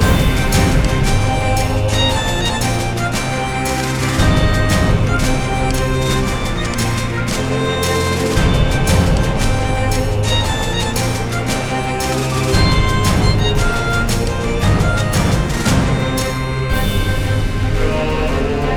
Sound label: big Win Loop; netent